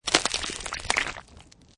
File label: Ice Stretching With Water Underneath; also great as a bone breaking, bone cracking sound; Snow and Ice